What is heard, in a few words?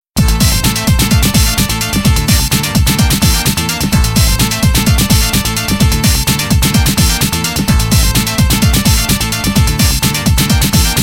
techno tune